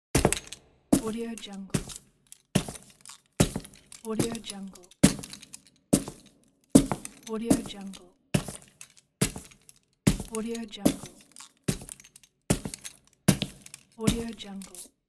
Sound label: police officer footsteps